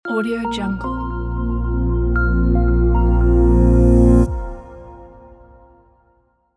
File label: marketing logo